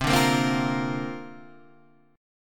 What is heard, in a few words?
C 7th Suspended 2nd Suspended 4th C down